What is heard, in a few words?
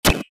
shot icecube break; Starbites; PS5 Steam Switch Switch 2 Windows Xbox Series X/S